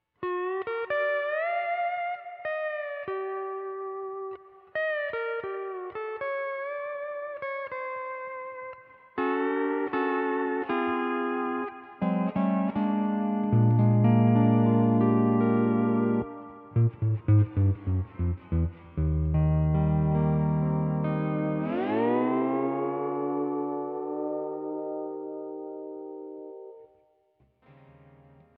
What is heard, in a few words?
hawaiian piece